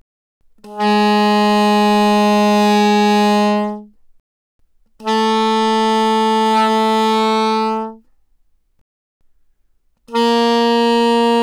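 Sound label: Soprano Sax No Vibrato ff Ab3 B3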